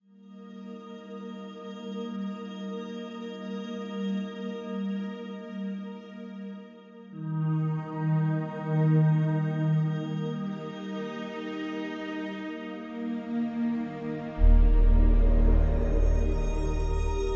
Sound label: Abyss Drone, Ambient